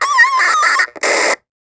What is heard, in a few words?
Walkie Talkie Feedback; Communications